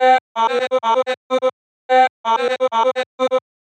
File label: chopped vocals female Cm 127; sound effects